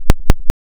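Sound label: ring modulation saw sin, silence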